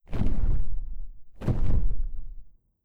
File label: Skel Drake Fllap